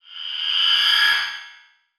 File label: Title Transition Swell; Production Element